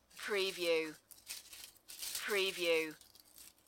Leaves Rustling

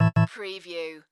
Casino Slot Machine Organ

